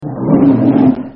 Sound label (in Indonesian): beruang